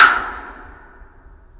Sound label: Snare, drums; Ableton Live 12 Lite Core Library